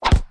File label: swish, basketball; Nickelodeon Basketball Stars 3; ThrillGames